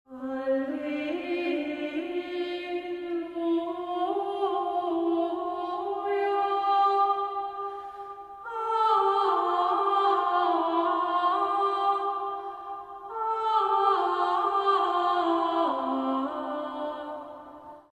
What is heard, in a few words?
Alleluia Iustus ut palma; Schola Antiqua